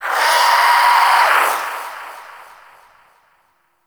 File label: Shadow Vocal Idle; Warframe; Duviri